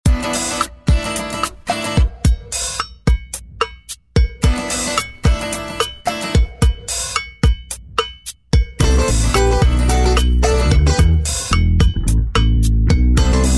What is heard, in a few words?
jazz